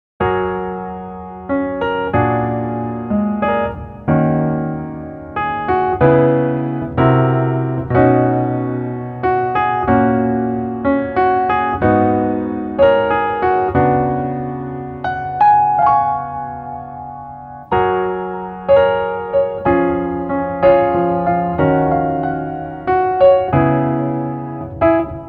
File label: Piano